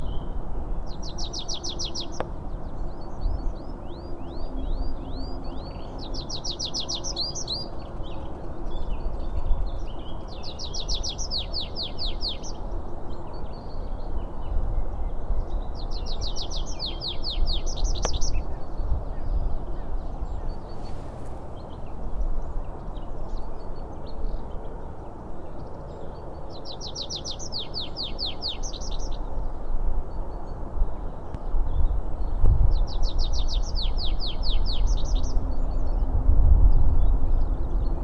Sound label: Pipit; MOTACILLIDAE, Anthus trivialis; Chant du Pipit des arbres